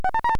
Motorola DTR 600 & 700 Talk Permit Tone, This is what it sounds like when the radio is programmed for encryption.; This is the sound made by the 600 & 700-series DTR radios make when it is clear to talk.